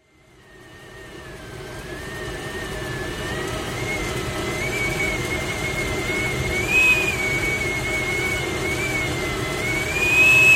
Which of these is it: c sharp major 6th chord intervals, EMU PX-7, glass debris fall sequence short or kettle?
kettle